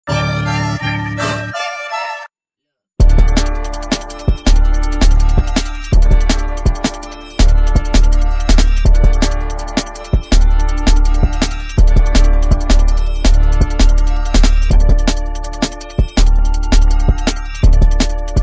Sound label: Instrumental